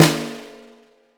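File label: Snare Acoustic; Drums; Acoustic and Electric; Polyend; Simulacrum Sounds; 1 Shots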